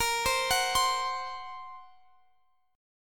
A sharp Suspended 2nd Sharp 5th A sharp down Guitar Standard; arpeggiated; capo=2